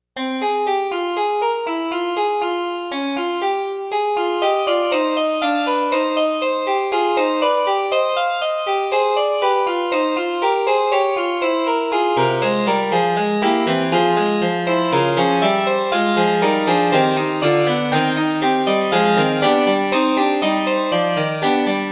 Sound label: Fugue; classical